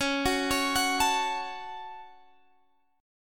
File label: C sharp Suspended 4th Sharp 5th C sharp, chord